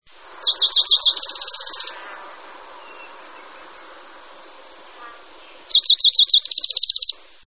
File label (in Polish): junko - inna piosenka